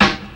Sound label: good steel snare drum g key; snare drum samples